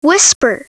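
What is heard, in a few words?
whisper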